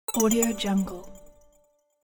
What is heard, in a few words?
game win